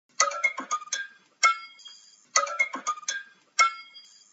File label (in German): Klingelton